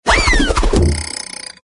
comedy vibrathrow

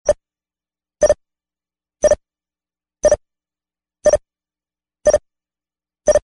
telemetry regular beeps

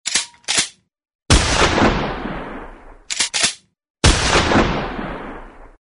guns shotgun pump action

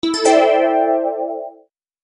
Incoming Email Alert

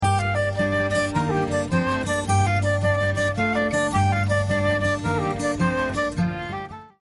gentle celtic flute

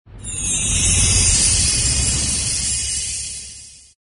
magic shimmer sprinkle; fantasy

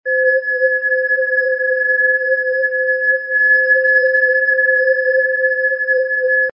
beacon tone

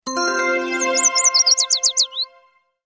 Ipad Tweet Alerttone

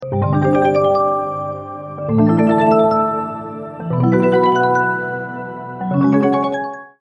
rising profits

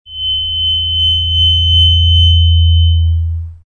microphone feedback sharp

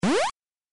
jump

